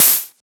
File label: Open Hat; FLPRO